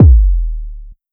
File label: Kick Pogo, 808 Kicks; Slate; Vintage Drum Machines Volume 2 808 Edition